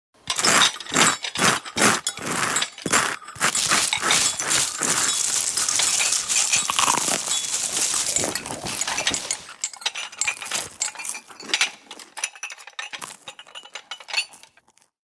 Busy breakfast room in the morning; Food

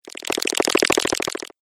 Game Creepy Centipede, Video Game Sound Effects

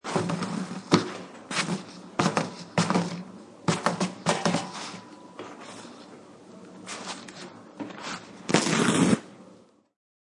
Toilet paper holder sounds.; Bathroom; Household

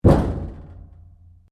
Strike Metal Tank Exterior

